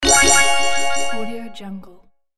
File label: game result; gamechestaudio